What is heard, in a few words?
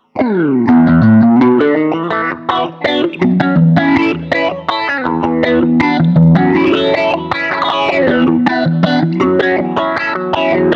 Phaser Funk